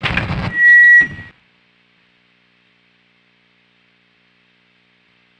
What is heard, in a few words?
Guitar pickup; Instruments; Zomboid; Lifestyle